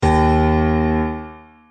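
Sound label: D sharp 3 piano; notes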